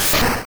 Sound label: Double Kick Single; Pokemon; Gen 1; attack moves; RBY